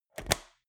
metal box small close hard; maschinen; soundpool

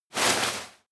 step walk graze grass; schritte

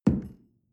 coffee place tablecloth soft wobble

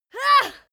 female moan pain short soft; soundpool

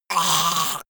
monster choking high; comedy-cartoon; soundpool

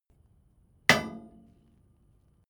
metal impact short; hits explosionen; metall

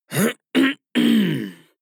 harrumph male hard; soundpool

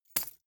key ring large drop carpet soft; objekte; schluessel

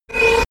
sci-fi laser shot charge short; soundpool

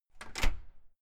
entrance door close slam outside

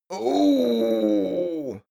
male grumble happy; menschen; koerpergeraeusche; soundpool

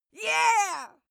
female cheer

